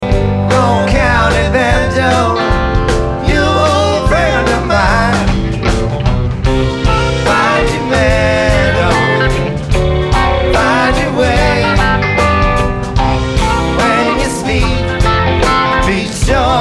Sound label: Banjo